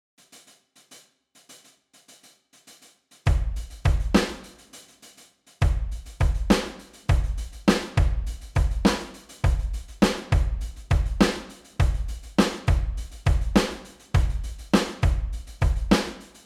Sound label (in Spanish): rap sin voz